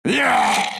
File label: Fire Archer Death